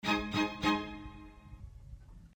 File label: Strings